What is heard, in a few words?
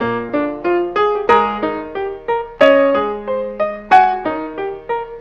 piano, Phonky loops